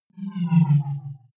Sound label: wobble drop hover